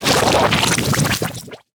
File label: Green Jelly Play